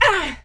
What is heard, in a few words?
grunt